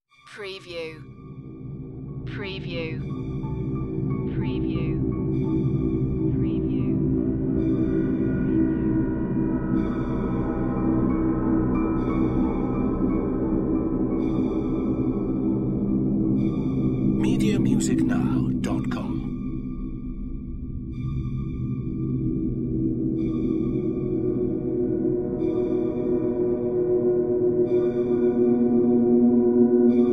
Trailer Production Elements - Texture - Horror, Uneasy, Twinkles, Drone